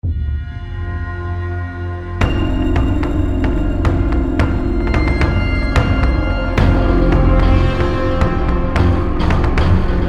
Dungeon BGM; closers

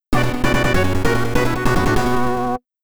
GAME OVER (YM2203); New City Hero

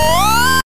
Wipe-up; puchicon; dsi ds

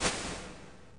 THROW; stardunk ios gamerip

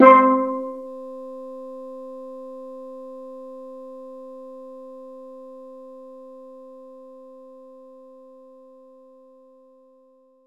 Steel Drums C4

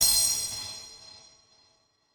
Bling Bling; hot lava; ios macos windows

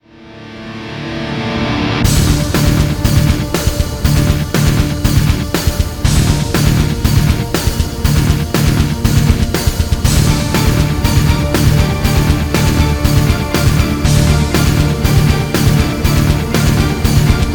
Action High; Emergency 5

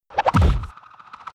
Poison; lego ninjago rise of the snakes

